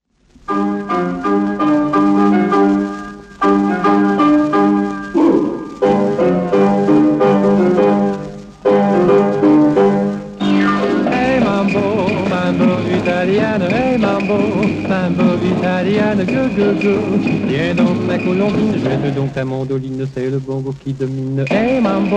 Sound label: Mambo